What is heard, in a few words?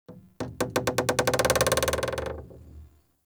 Vendor Wood Floor Creak; Ambience; Soul Plane